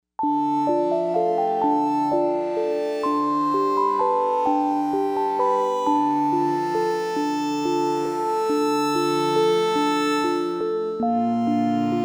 Viol Bell; Novation UltraNova; Factory Patches

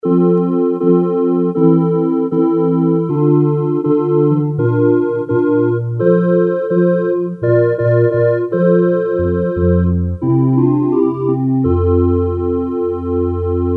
Organ, Casio CT-370; Tones

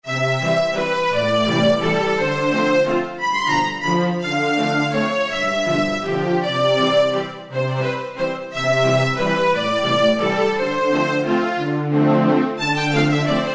ST Section, Yamaha TG77; Factory Presets

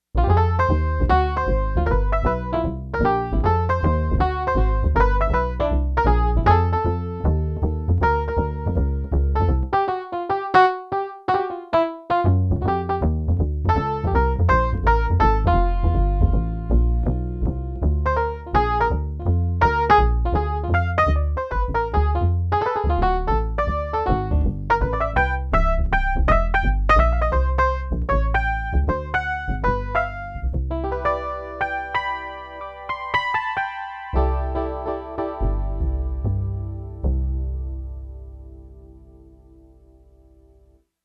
Jazz Bass Piano, Yamaha TX802; Performances; Factory Patches

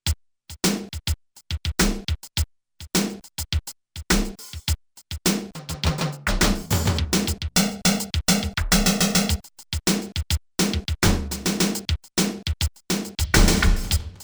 Power Drums song

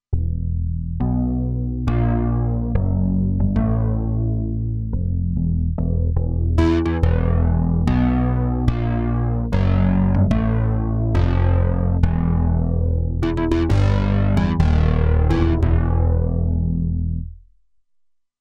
Mod Bass, Roland Alpha Juno-2; Factory 2

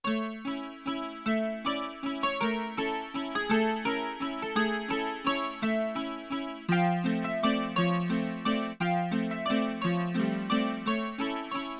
Symphonic Claviano; Yamaha TX16W; Jazz Performance; Jazz Performance 2

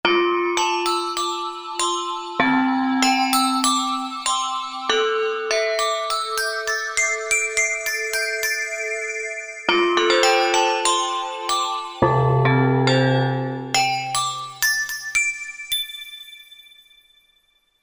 Thai bell; Korg MSC-10S Ethnic

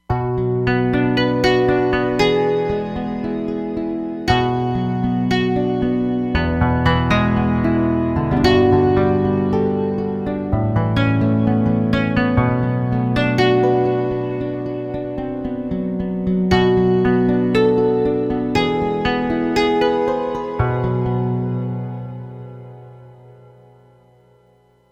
Unpluck'd

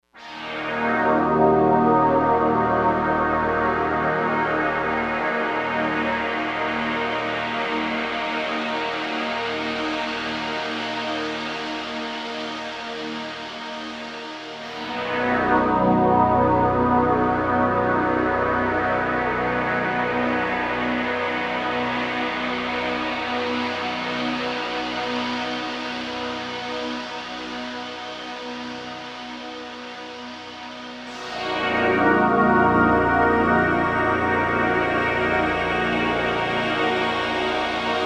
Slow Space, synth

